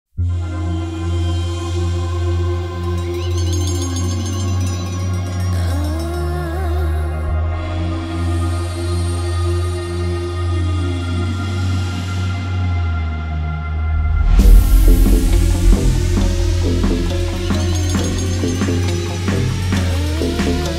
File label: Warzone Bass Boosted